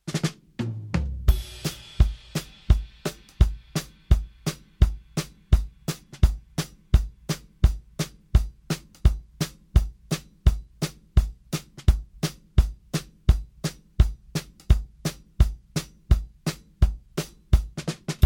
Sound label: 170 70s pop